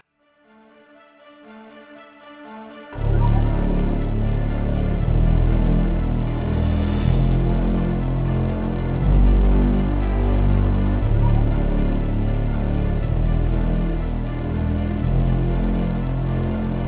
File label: suspens